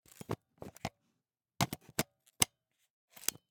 Camera, Pentax KS2, DSLR, mechanical, removing lens, replacing lens, twist, unlock, lock, XY; Eiravaein Works; Vaeyan IV